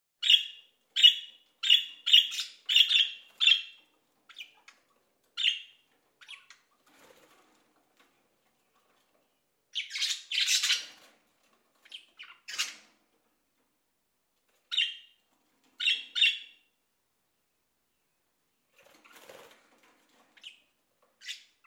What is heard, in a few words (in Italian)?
uccelli birds, animali